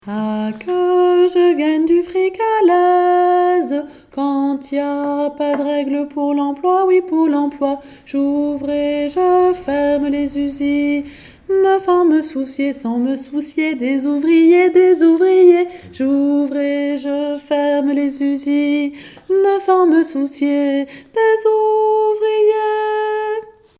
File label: tenor